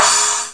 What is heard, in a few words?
cymbal, percussion instruments; Sound Effects for Multimedia - Volume 1